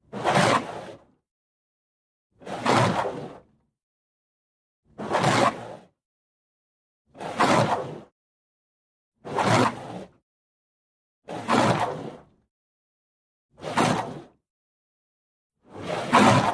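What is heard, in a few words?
Water Spray Interior Car By Hard Med; Car Wash; Spraying; Big Room Sound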